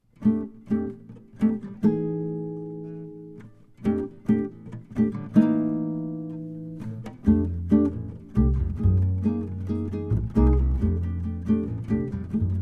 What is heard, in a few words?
Jazz Trio Sax Guitar And Bass